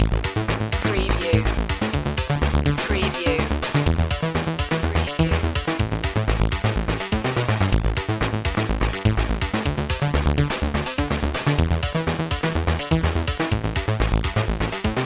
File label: retro video game hotfix loop